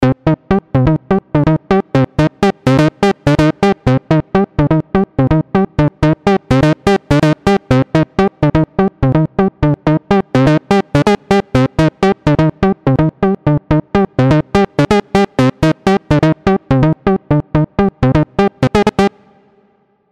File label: Mini Brute V there is waves inside